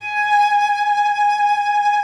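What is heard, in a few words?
G#2, cello vibrato; Xsample VOL-7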